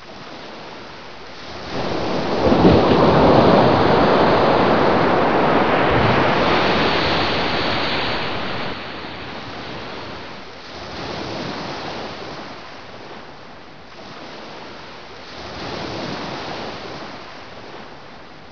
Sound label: sea waves; caged